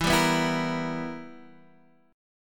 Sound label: B Major 7th Suspended 4th Sharp 5th